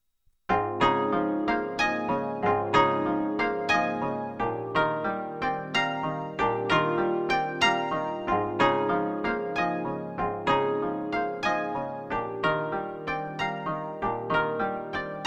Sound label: koto